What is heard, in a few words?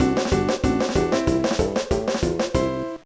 Jingle, gba; Backyard Baseball 2006